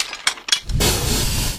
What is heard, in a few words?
lever, buttons; Garry's Mod; hl2